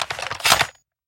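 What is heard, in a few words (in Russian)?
сильный резкий чёткий звук меча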